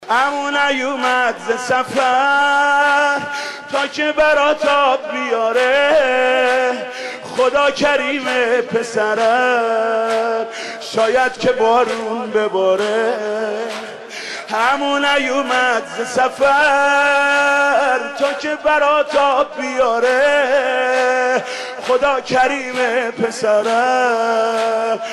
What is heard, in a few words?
Mobile ringtone